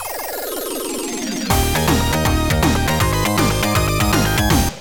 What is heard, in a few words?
Professional Jingle; superlite 2500 custom mahjong